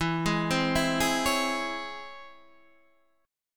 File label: Db Minor Major 7th E